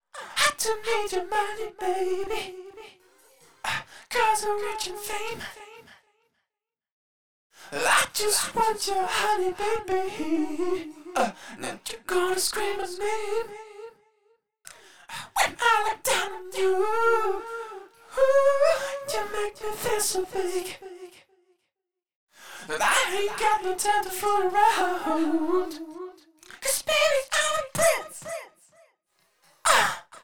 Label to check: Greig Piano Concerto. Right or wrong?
Wrong